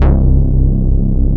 ODY PWM, ARP Odyssey

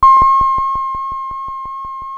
buoy low, Chroma Polaris; Rhodes

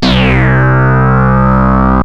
sync bass, Chroma Polaris; Fender Chroma-Polaris

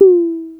high tom, Kawai SX synths

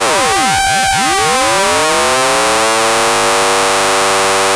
ODY, ARP Odyssey

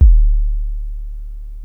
kick c2, Chroma Polaris

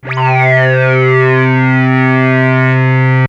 syncsweep, Chroma Polaris; Rhodes

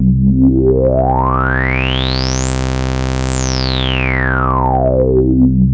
101-SWP1, Roland SH-101

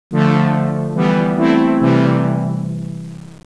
BRASS, Siel DK-600

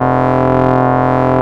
ODY VCF, ARP Odyssey

